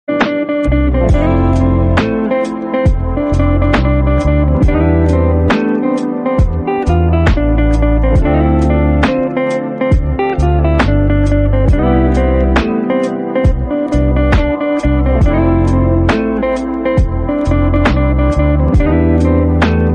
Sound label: bgm, instrumental